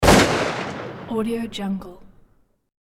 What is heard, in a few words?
gun blast single long reverb large calibre; hollywoodedge